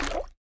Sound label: Damage